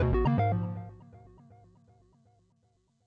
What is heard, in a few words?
chord